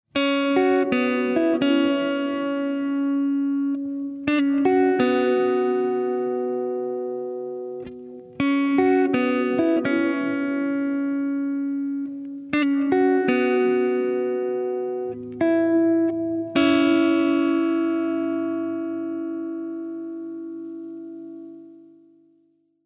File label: natural reverb; teraecho